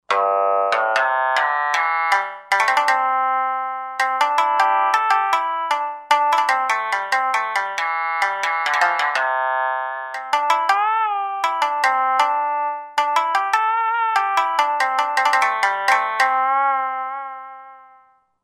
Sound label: Jamisen, Roland JV-2080